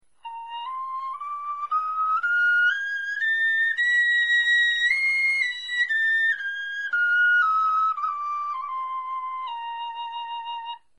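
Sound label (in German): tonleiter, Knochenflöten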